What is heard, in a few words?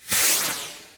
firework single; best service Blue Box